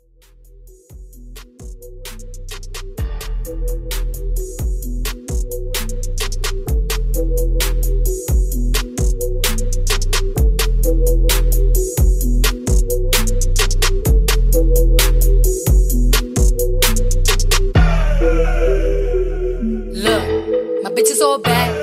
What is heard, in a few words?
Hip Hop Funky Bass 130 BPM